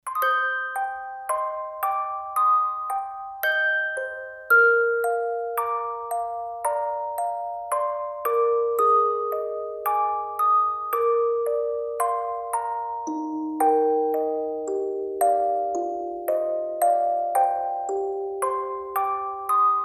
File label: interlude music box; Dongzhou